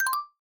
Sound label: tweet received; TWBlue